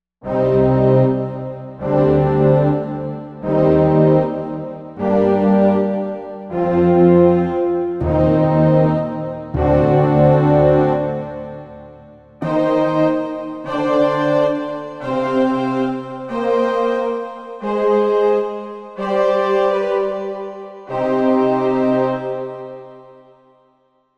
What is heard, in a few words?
Symphonique